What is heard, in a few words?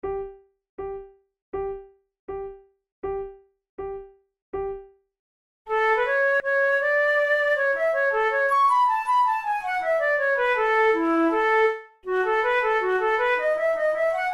duos allegro moderato, flute; devienne; nouvelle methode pour la flute 18